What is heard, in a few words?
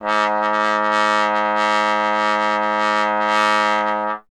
Trombone G#; Miroslav Vitous - Solo Instruments VOL-1